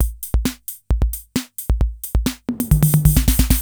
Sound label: KR55 ROCK, drum; Crypton - DrumBase5000; KR55B